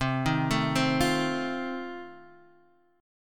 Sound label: F 7th C